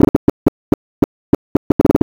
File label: scanner blip; npc; Half Life Alyx